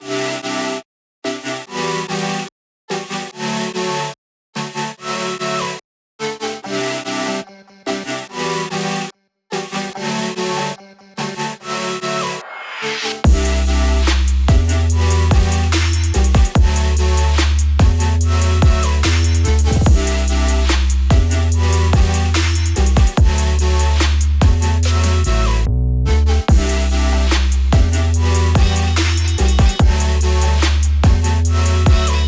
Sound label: EDM; Chunky; test drive unlimited solar crown; xbox series xs